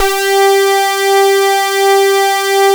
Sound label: LEAD BRS G4; Drums In Your FACE!; LEADBRASS